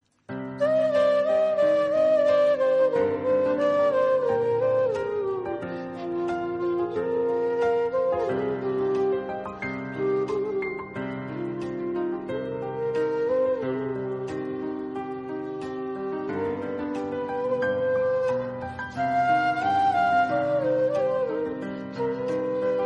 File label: Flute; Tamil